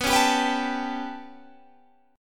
B 7th Suspended 2nd Sharp 5th down Guitar Standard, chord; B7sus2#5 strummed; JGuitar